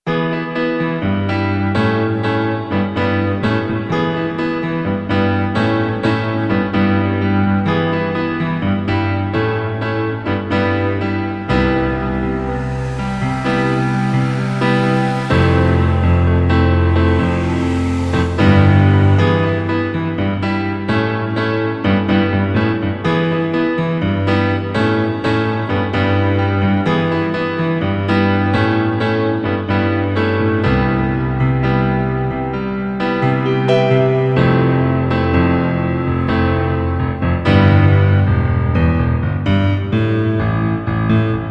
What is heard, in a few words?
piano school